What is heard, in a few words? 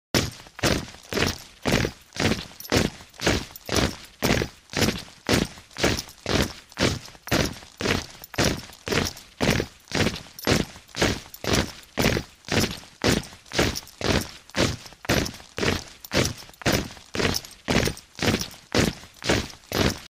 Parade of soldiers